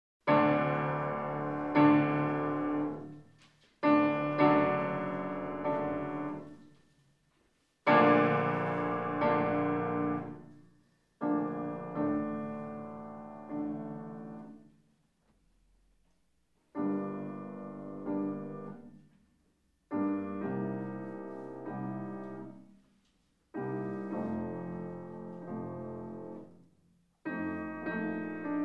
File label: Piano Recital, Pianist live; Liszt; Handel Almira